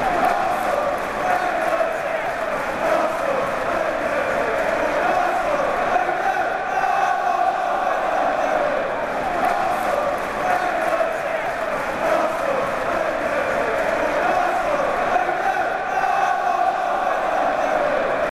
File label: We love you Arsenal